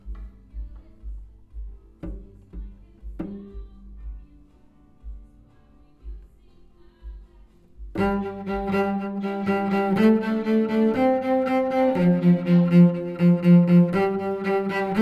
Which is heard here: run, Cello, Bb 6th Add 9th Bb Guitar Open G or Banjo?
Cello